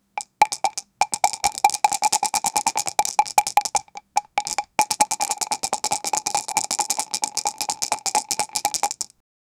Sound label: dice shaking in glass